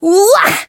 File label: Voice attack; nekoko